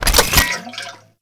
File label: Mech Oil Grenade Create; Warframe; Nechro Mech; Abilities